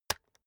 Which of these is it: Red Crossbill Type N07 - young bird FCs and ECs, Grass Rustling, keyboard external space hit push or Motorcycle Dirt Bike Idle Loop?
keyboard external space hit push